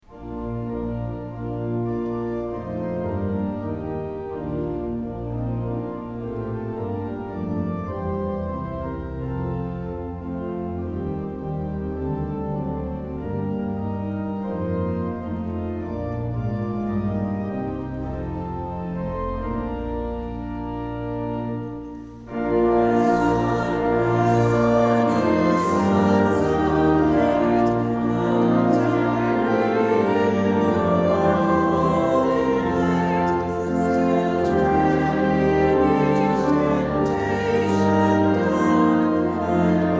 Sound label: hymn